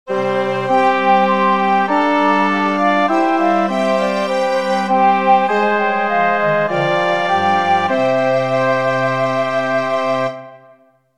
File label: Tutti